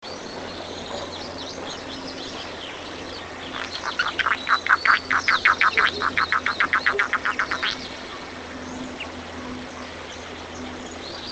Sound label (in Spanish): chotoy; cantos